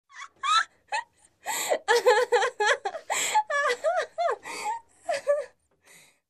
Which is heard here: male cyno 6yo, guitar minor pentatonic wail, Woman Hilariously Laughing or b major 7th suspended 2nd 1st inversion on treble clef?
Woman Hilariously Laughing